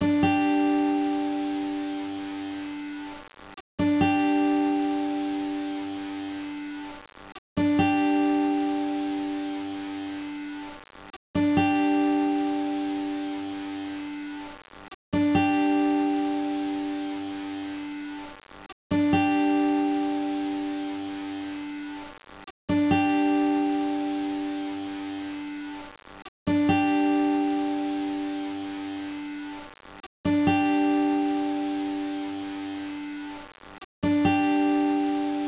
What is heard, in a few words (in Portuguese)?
guitarra Loop